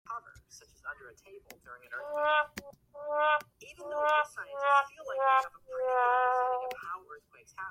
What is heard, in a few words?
sad trombone different